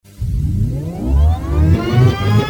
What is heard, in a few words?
Organ start